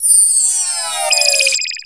success

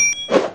attack

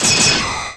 rabbit iceslug attack

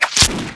luciola vespa attack

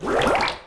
crab stand

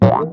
damage; SKIPSKIPPER

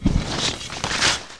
equip item

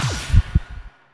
witch stand; death witch

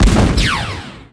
fire pillar; wizard

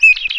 icewind damage

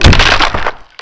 wood goblin attack

